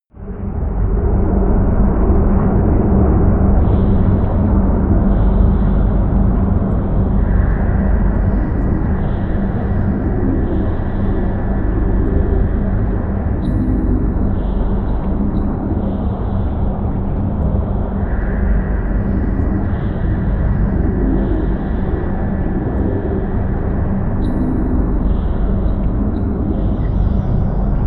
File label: drone swarm